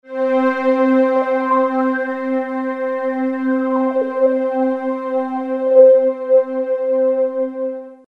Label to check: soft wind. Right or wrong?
Wrong